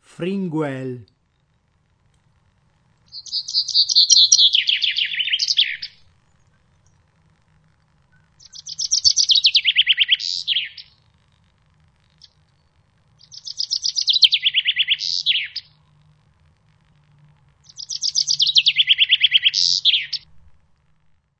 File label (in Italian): Fringuello